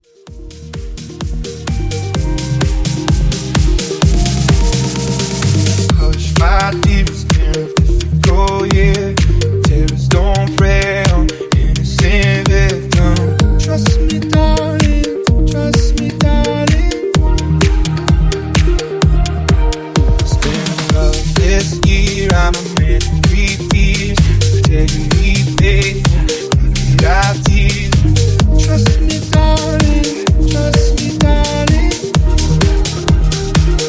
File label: Upbeat Redrum; Remixfreakz